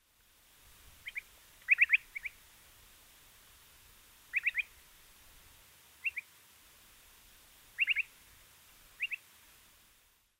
batitu, Bartramia longicauda, Upland Sandpiper; cantos

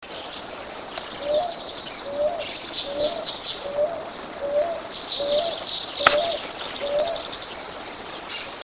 torcacita; cantos

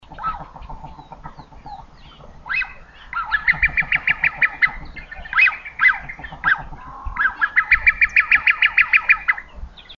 cauquen comun, Chloephaga picta, Upland Goose; cantos

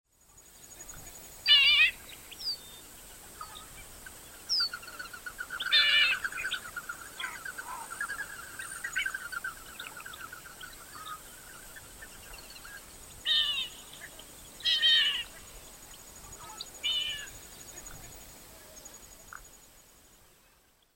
pato collar, Callonetta leucophrys, Ringed Teal; Pato de Collar; cantos

